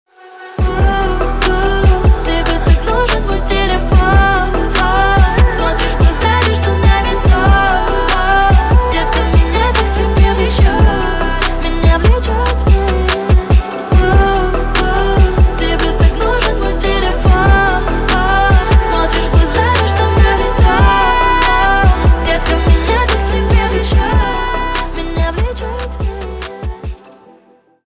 telefon

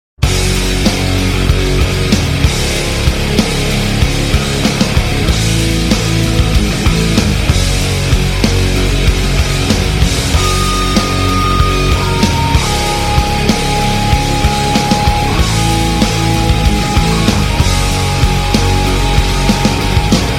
instrumental

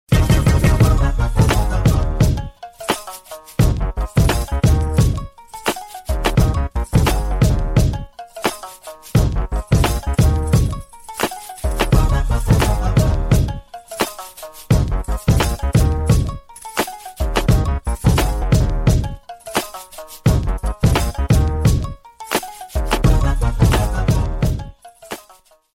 instrumental

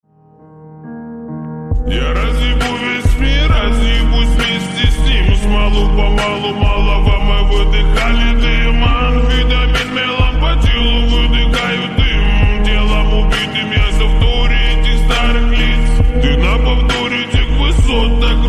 slowed reverb bass

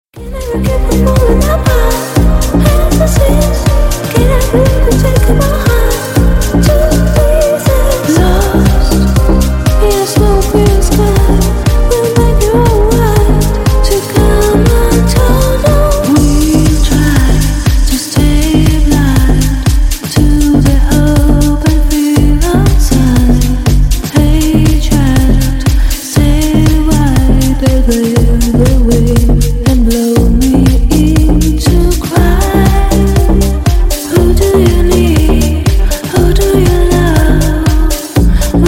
deep house remix

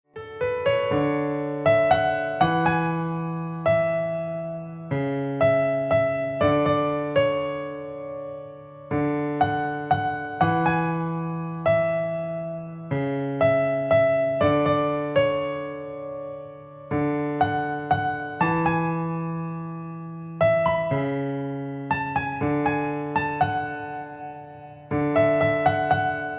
piano cover

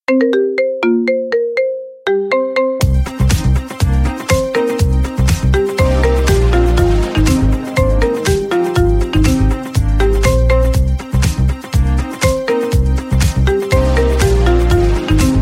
marimba remix

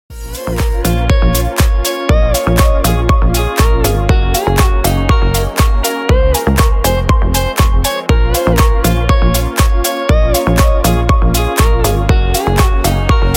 deep house

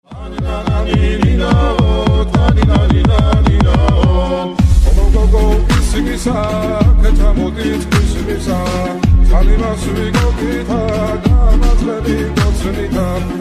Georgian folk acharuli trap remix

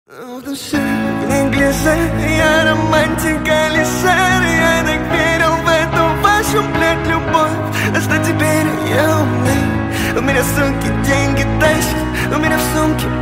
acoustic version

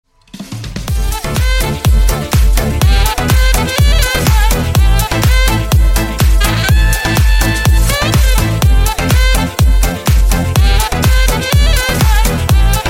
techno project remix instrumental

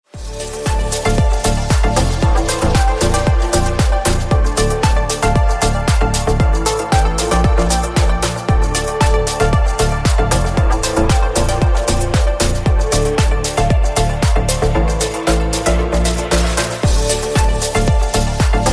instrumental mix

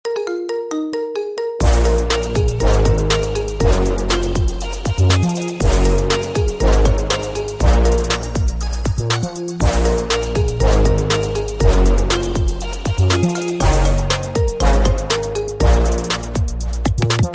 marimba remix

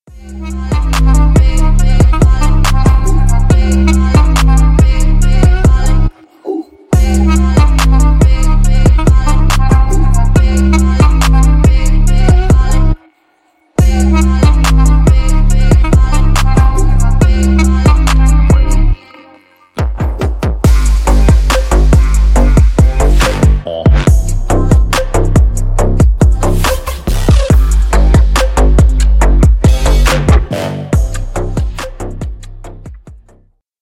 the first station auf instrumental

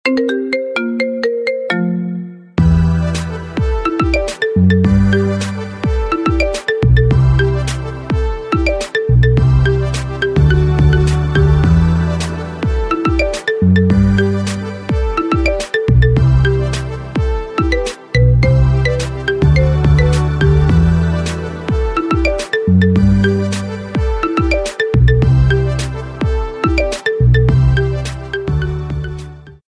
marimba remix

